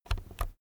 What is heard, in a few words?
keyboard external key press slow soft